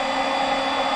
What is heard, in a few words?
cmp loop; amber; roxy